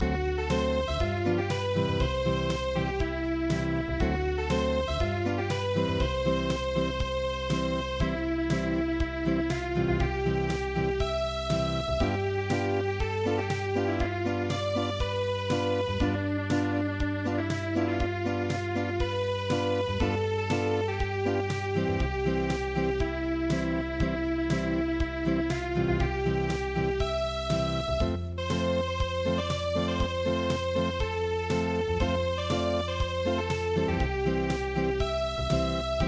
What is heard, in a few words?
Unplugged, midi; HE ALOHA NO O HONOLULU